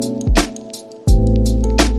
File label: slide whistle down